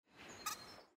mushrooms squeak; gris

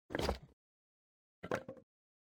pick up and drop slingshot; baldursgate

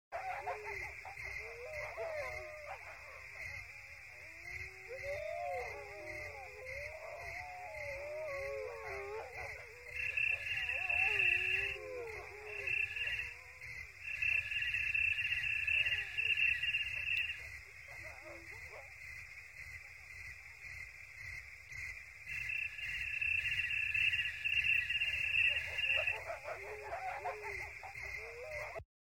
ambientsound forest night; baldursgate

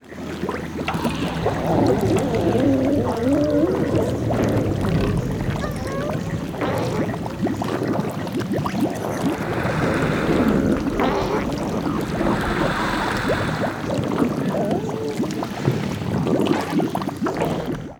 rotting sarlacc; battlefront 2 2017; felucia

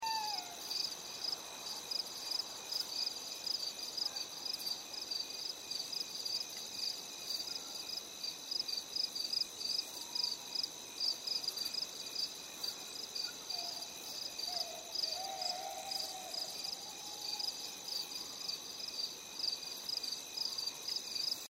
dorm outside night sound; life is strange

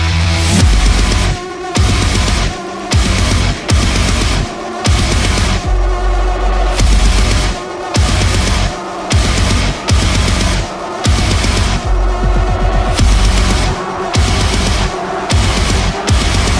music; Doom Eternal

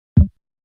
button press; baba is you

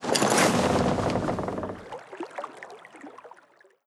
give flee move order; europa universalis iv

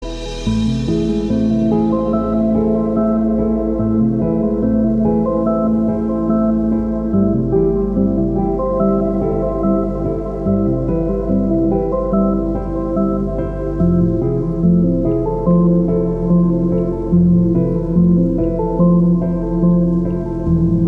resurrection loop; celeste

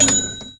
sfx unlock feature; duck game

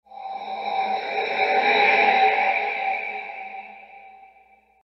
spirit ashes summoned sound effect; elden ring

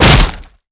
metal heavy bash flesh; Warcraft III Frozen Throne

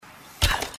bow shot; rise of the tomb raider

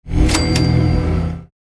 xp; fallout 4

